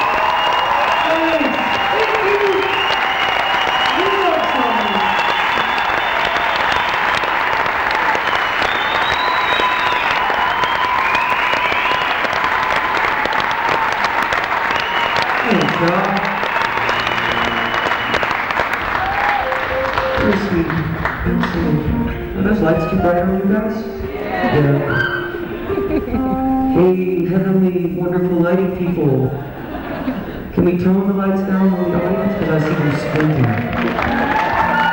crowd; canberra theatre; canberra, australia

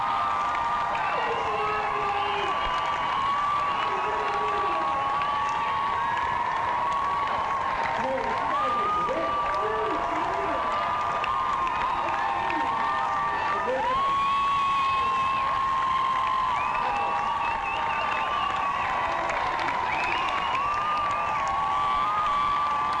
crowd

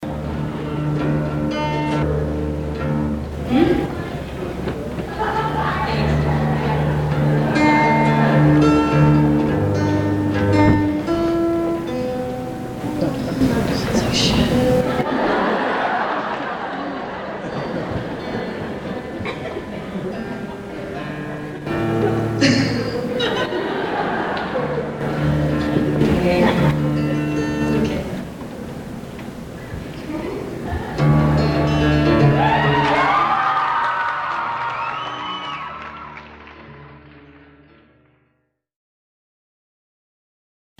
tuning

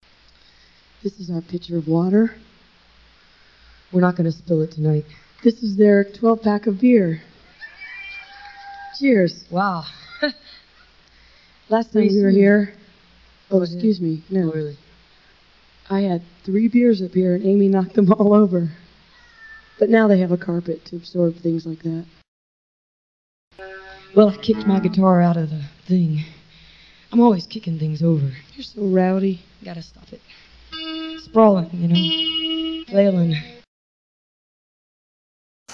crowd talk spilled beers

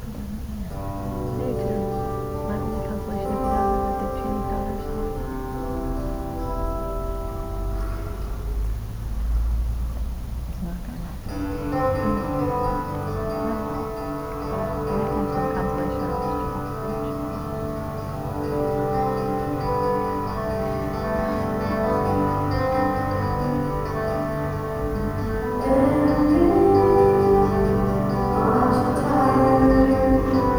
soundcheck

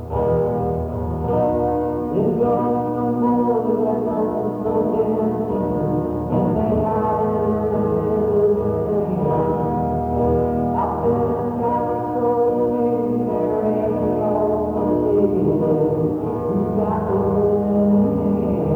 soundcheck

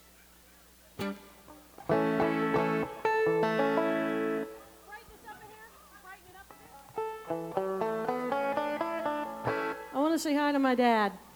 banjo tuning; terminal west - atlanta, georgia

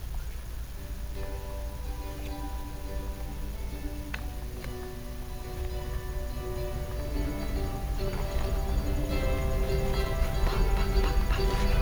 soundcheck

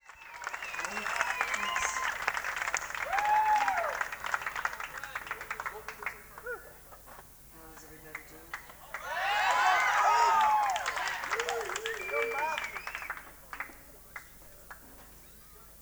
applause; krasnapolsky - copenhagen, denmark

